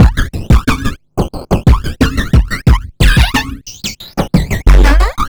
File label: robobreaker 2 090bpm, downbeat dance grooves; Zero-G